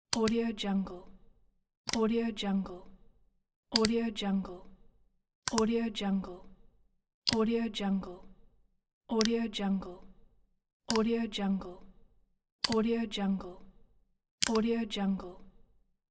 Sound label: mouse click